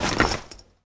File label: Backpack